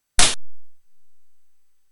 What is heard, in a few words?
gun; prototype; the noise made for when the enemy soldiers fire a bullet; Shadow Dancer